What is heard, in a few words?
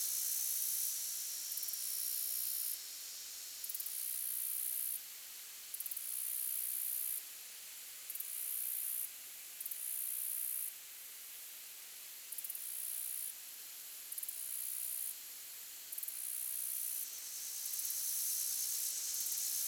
insects loop